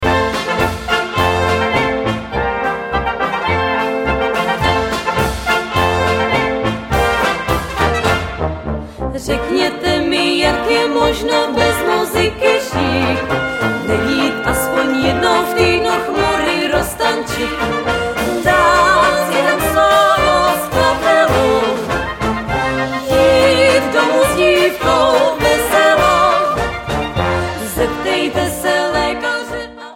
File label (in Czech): polka domova